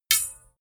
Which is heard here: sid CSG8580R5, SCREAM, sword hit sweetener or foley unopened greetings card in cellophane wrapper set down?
sword hit sweetener